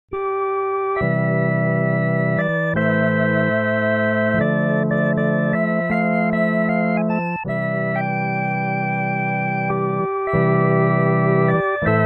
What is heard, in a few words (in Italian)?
solo musica